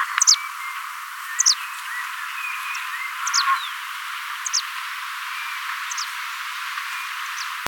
bird

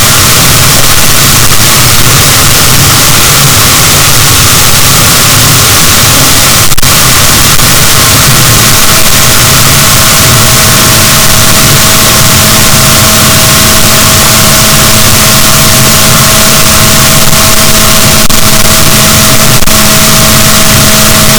rorquals negatif, boat

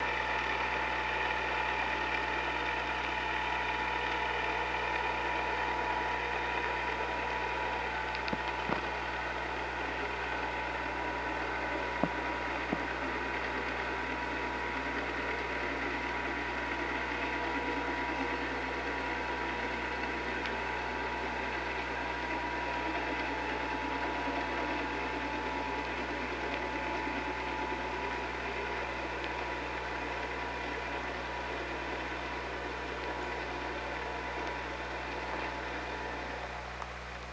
OrcaLab

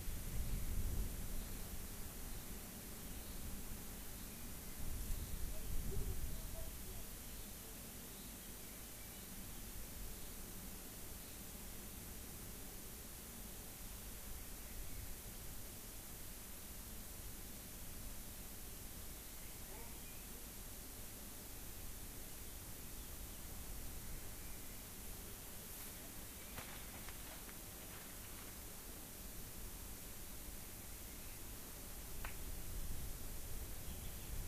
rayol farm; SEAMED

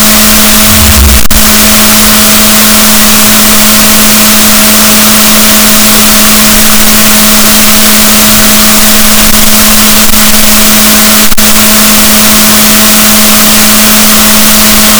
rorquals negatif, gaussian noise; Balaenoptera Physalus; SNR 0dB

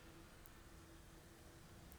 pulses, BALAENOPTERA; MONACO 2022-07

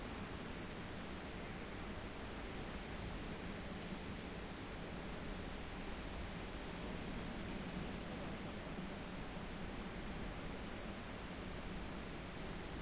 rayol farm; SEAMED

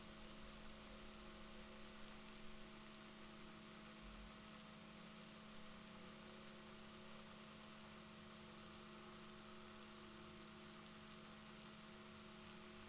rorquals positif, Balaenoptera Physalus Chile

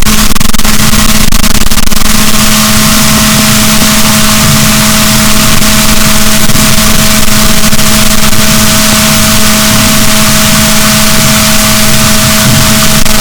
rorquals negatif, boat

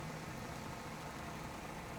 pulses, BALAENOPTERA; MONACO 2022-07

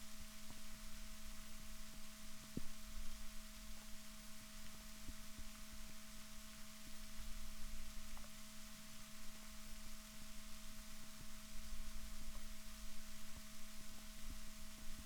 rorquals negatif, Balaenoptera Physalus Chile

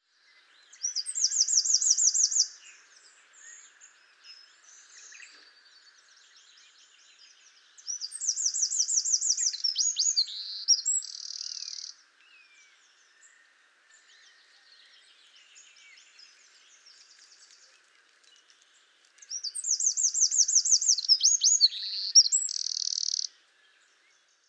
Troglodytes troglodytes; SETE

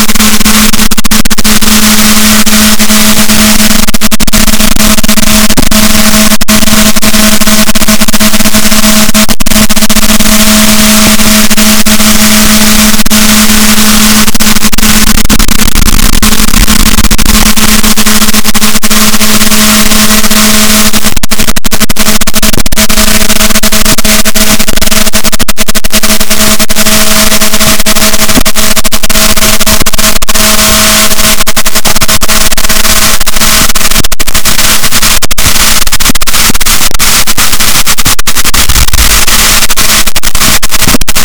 rorquals, Balaenoptera Physalus, boat; Chile; SNR -6dB

